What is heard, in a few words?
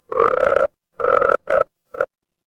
animal frog